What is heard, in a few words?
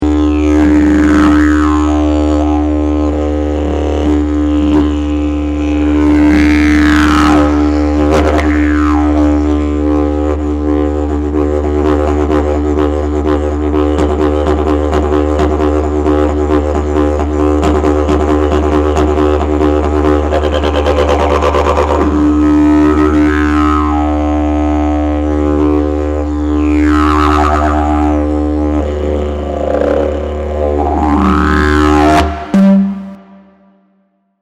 didge